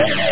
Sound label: feed loop flange, guitar; OctaMED SoundStudio V1